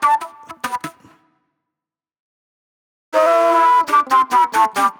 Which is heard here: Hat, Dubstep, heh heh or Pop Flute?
Pop Flute